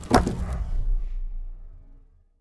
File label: press tile